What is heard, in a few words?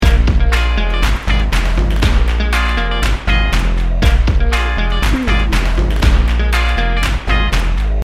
funk junkie loop